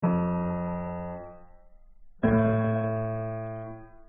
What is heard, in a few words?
piano